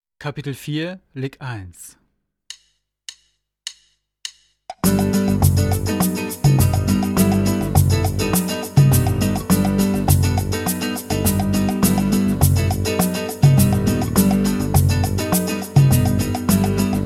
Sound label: Latin Style Guitar